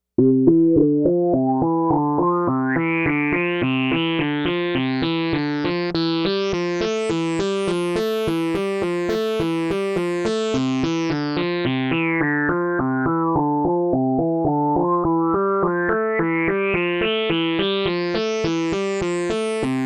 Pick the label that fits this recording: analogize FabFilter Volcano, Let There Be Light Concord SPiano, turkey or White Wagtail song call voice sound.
analogize FabFilter Volcano